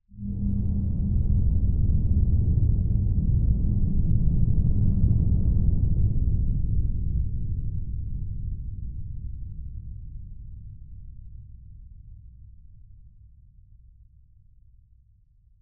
suspense; DayZ Epoch

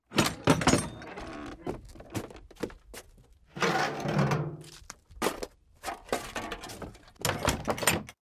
shed; DayZ Epoch

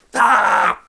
spotted, zombie; DayZ Epoch

